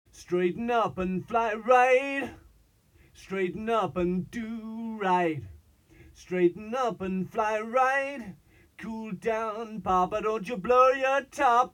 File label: Resonators Vocal 5mm MDF Panel